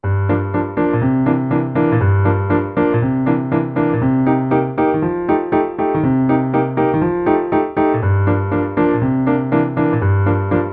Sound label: POP RAP